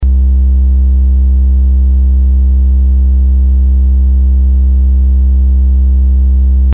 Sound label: vco tri wave, modular synth; Dreieck